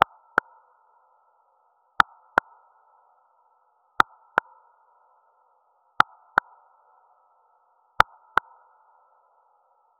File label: ui button clicking sound; ritual